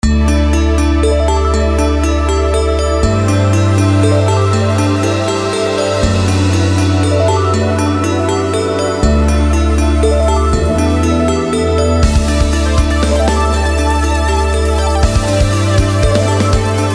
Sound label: Frozen Air loop; otome the exorcist